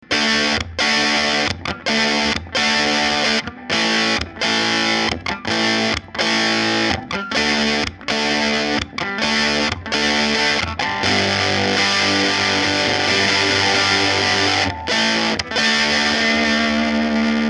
canal disto ibanez; guitare; ampli 12AT7 + ECL82 SE

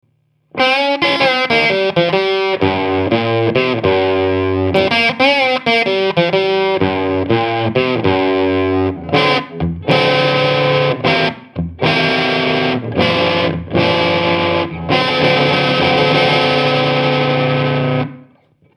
hifi 2SK30

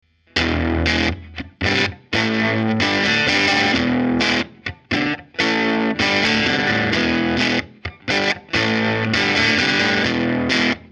crunch ibanez; guitare; ampli 12AT7 + ECL82 SE

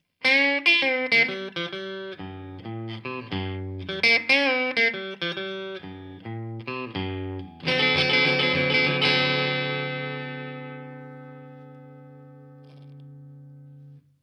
tele chevalet manche

